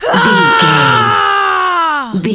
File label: voice female battle shout